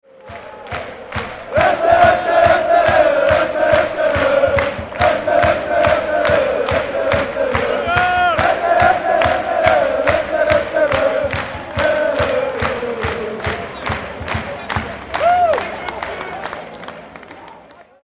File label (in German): Zillertaler Hochzeitsmarsch Gesang; fangesaenge; DreamTeam